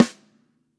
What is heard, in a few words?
Pop